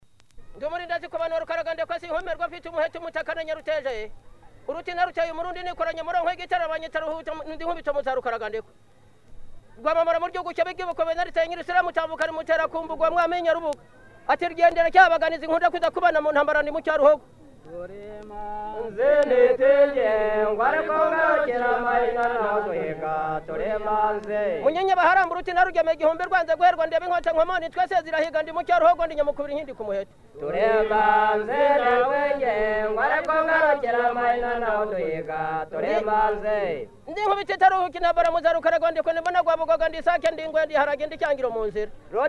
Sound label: Ekyevugo Hutu, instruments uganda